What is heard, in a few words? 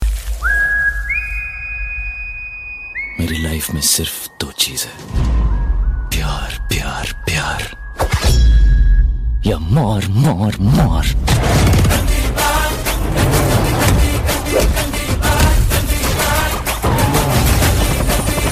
ringtone